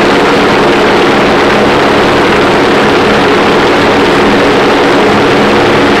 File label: engine bomber mid; War Thunder